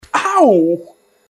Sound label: owwww